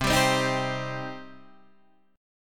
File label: G Suspended 4th Sharp 5th C, Guitar